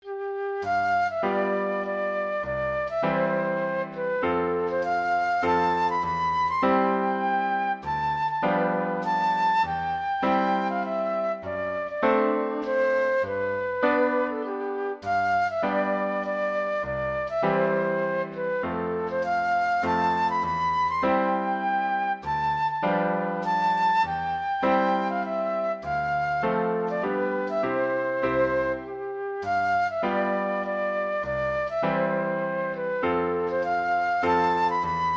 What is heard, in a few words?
flute